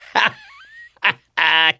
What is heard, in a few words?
laughter, mechanic, survivor